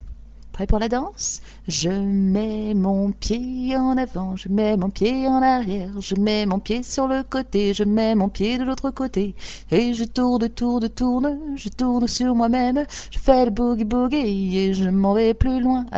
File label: boogy rapide